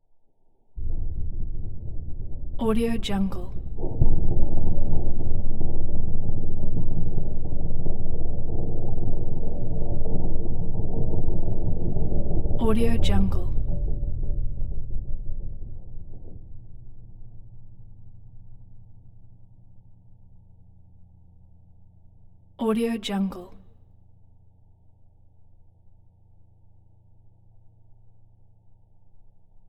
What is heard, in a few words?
earthquake deep subterranean booms; prosoundfx